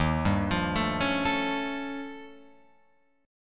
D sharp 9th Flat 5th D sharp, Guitar Open F; D#9b5 arpeggiated; JGuitar

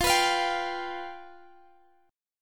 F Suspended 2nd Flat 5th, F; Fsus2b5; strummed; x,x,15,16,18,15

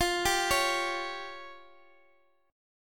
F Suspended 2nd Sharp 5th F down Guitar Open G

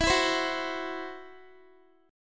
E Suspended 2nd Sharp 5th, Guitar; Esus2#5; strummed

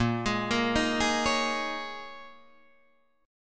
Bb Minor 11th Bb down Guitar DADGAD

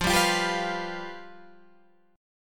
F Suspended 2nd Flat 5th, Guitar, Open G

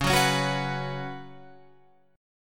C sharp 7th Suspended 4th C sharp, Guitar Open F; C#7sus4; strummed; tuning=Open F; guitar chord

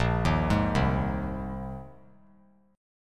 A sharp 7th Suspended 2nd Suspended 4th A sharp; arpeggiated; 10,10,11,10; chord; JGuitar

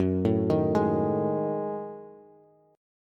Gb Minor 6th Add 9th Gb down Bass; arpeggiated; JGuitar

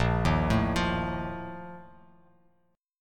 A sharp Major 7th Suspended 4th, Bass Standard; arpeggiated

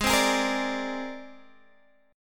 C 7th Suspended 2nd Sharp 5th G sharp

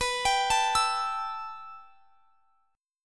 B 7th Suspended 4th Sharp 5th; B7sus4#5; arpeggiated

